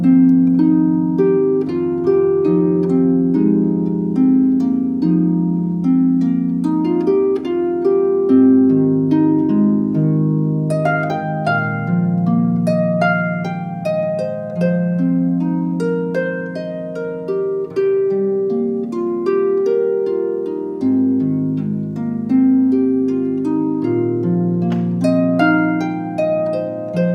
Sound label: harp